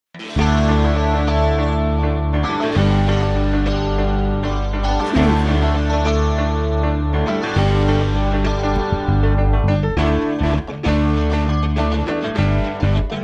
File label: good time rock no drums